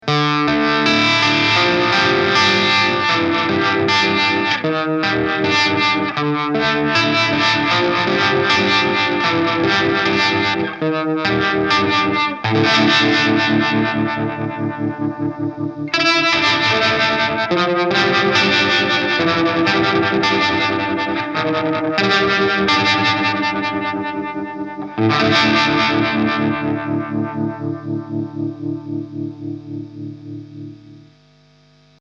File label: Ernie Ball Tremolo Harmonic crunch